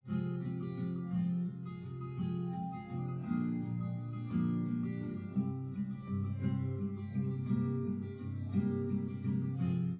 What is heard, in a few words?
nodrums liverec 250ms 5cm sim